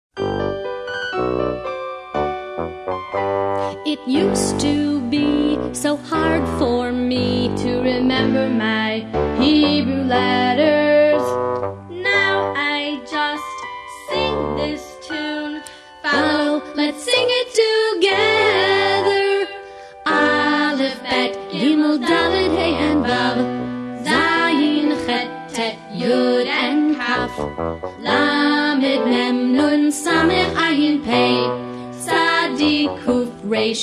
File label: Singing Aleph-Bet